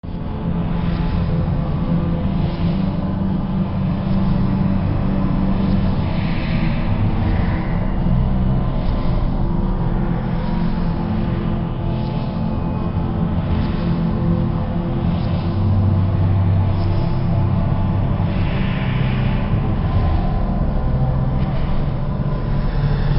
Echo

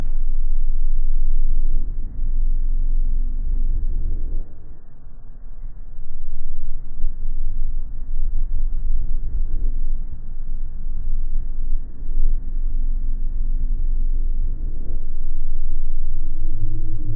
Lfo

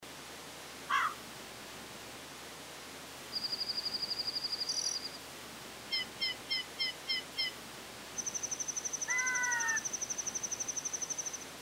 Nature

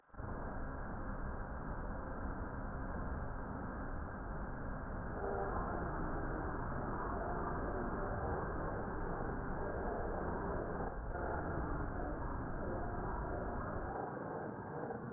Drop

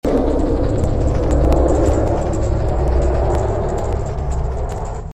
Fall

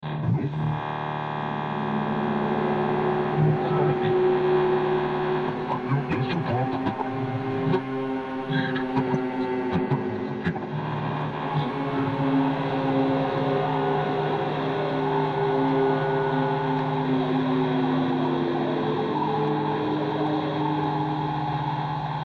Transmission

